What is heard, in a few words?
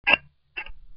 scissor cut